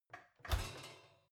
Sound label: oven open baking sheet ringing